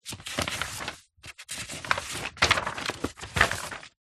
paper document thick many pages search through find page; foley; zapsplat